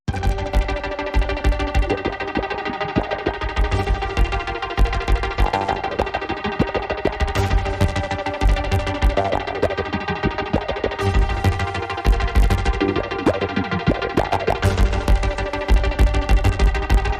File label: Faster Faster, No Drums